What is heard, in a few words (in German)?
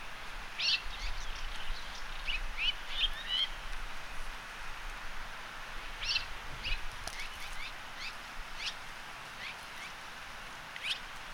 roetelschwalbe; Hirundo daurica